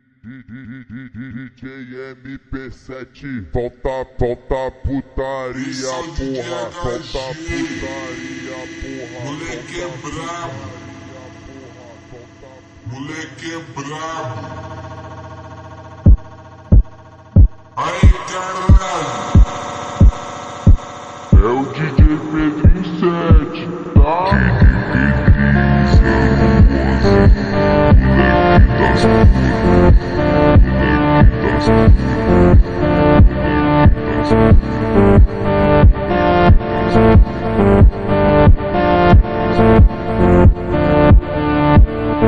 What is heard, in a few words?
automotivo slowed reverb